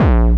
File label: bombo, kicks, Hardcore